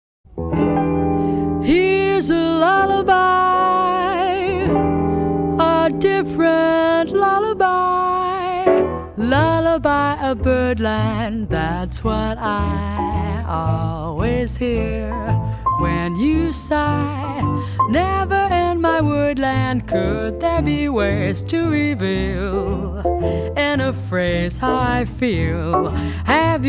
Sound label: lullaby